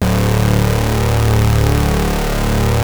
Prophet 5 D2, Synthesizer; Hollywood Edge - Giorgio Moroder Rare Synthesizer Collection

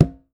tumba, congas; Voice Crystal - Steve Reid Definitive Percussion

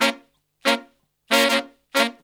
92 bpm, horns; 90 s Sample CDs; Zero-G; Phantom Horns

